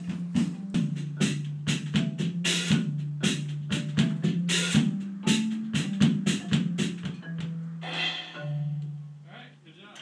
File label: drumming song